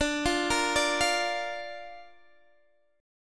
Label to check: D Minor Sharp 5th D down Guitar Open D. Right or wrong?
Right